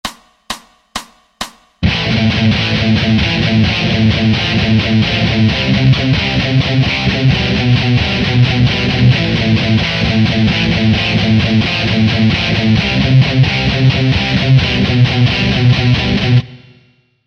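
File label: Rhythm Guitar Power Chords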